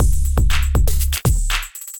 Electrified Kit; Electrified Kits

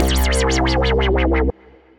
Scissor Burn, Bass

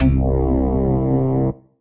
Slap Synth, P-50 Linear, Retro Synths; Ableton; Factory Packs; P-50 Structures

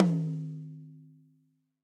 Tom High Steely Tight; Drums; Drum Hits; Ableton; Session Drums Studio; MPE

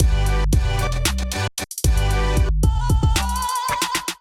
Azimuth Kit groove 114 bpm; MIDI Clips; Ableton Factory Packs; Beat Tools

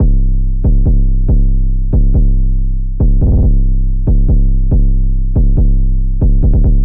808 Bass C 70 bpm; Enigma

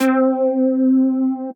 Old School Piano; Synth Keys; Ableton; Factory Packs; Beat Tools

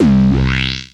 Dudz, Analog Bass; Ableton; Retro Synths; Analog Bass Vol 2

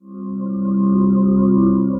Deep Drone, Ethereal Pads; Instruments; Ableton; Mood Reel

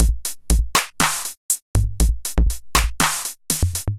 Retro Kit, Drum Machines; RejuviNation Retro Kit; Ableton; Factory Packs; Drum Essentials

